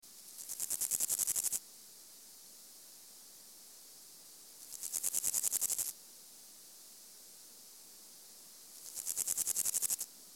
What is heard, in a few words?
Pseudochorthippus parallelus field recording 24°C